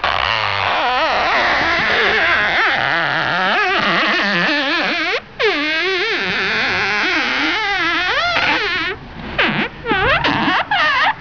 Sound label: Stretch, cartoons